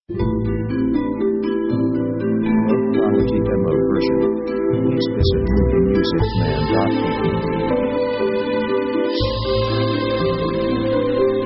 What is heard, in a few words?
orc downstream; contemplative themes